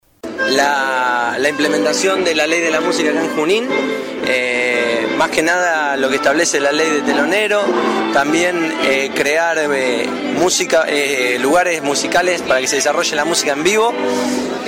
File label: Basso